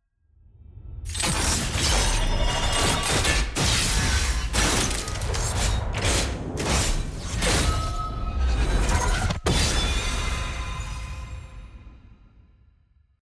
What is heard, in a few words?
level up; champion mastery